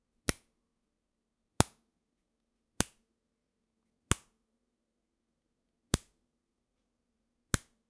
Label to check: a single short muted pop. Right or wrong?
Right